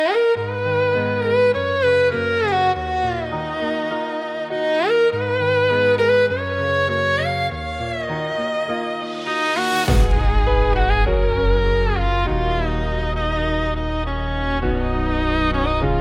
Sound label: B♭ minor · Melodic Rap · 105 BPM